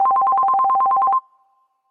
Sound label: ringtone; simplex-chat